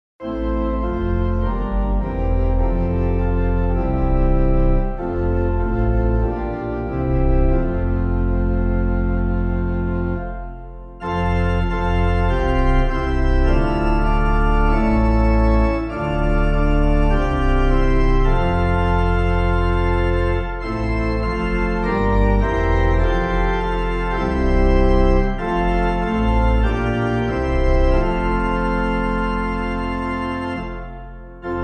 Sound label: Christ Is Here Emmanuel, organ, hymn; Stanbrook Abbey; CASWALL; Clyde McLennan; Friedrich Filitz, 1804-1876